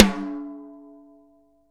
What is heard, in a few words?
snare dry; Real Mega Drums